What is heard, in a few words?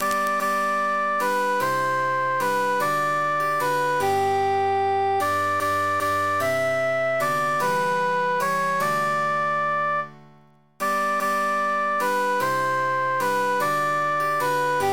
cantus emphasized; of all the birds